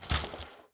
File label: move; jocly; mana